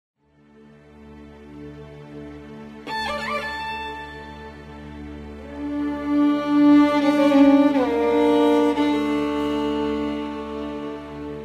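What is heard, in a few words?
skripka mysterious, violin mysterious; minecraft; Votive Content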